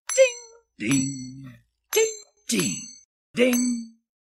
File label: Ding Ding